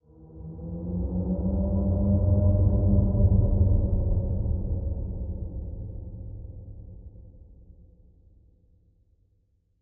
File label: Horror Ghost Moan Dark Deep Angry